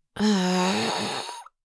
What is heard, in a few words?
Adult female moan in pain